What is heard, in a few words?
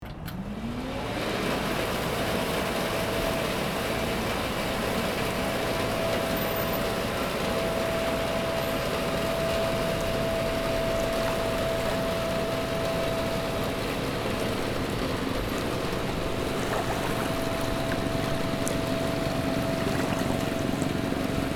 Boat leaving the port exterior ambience